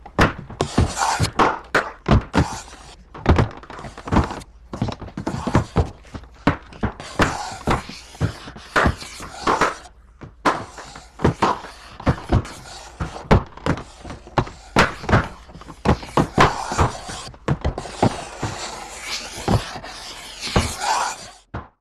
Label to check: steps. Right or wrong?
Right